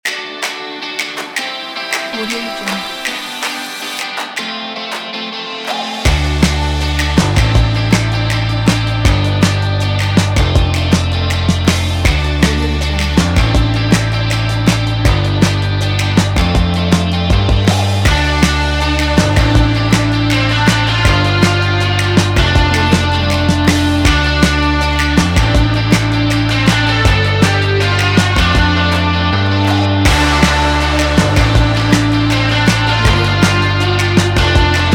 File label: happy rock